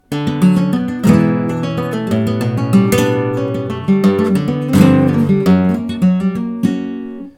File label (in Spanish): falseta